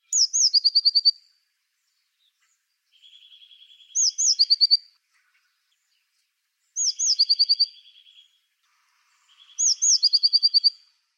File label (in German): Blaumeise